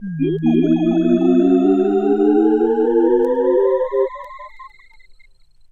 Juno106 Bell Riser Vinyl Synths C2; vinyl fx

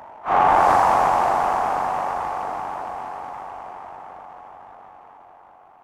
evil spirit

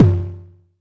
Tom Low

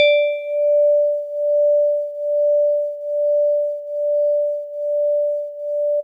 Jazzy Organ DX100 D5

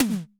Tom Slam

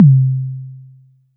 Tom C3

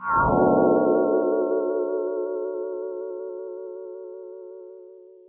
SYNTH; Stetlz Moodz

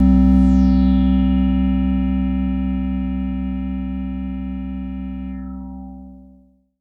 SYNTH

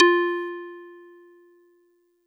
Marimba DX100 F5

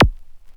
Prodigy Pitch Rim Vinyl Synths C3; vinyl perc

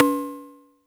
Rim Shot FX

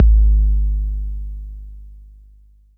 BD 808 Noise

